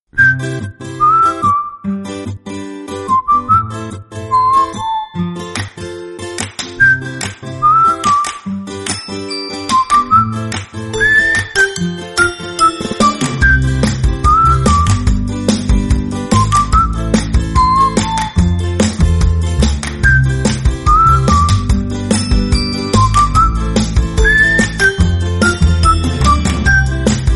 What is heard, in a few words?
Be happy song Instrumental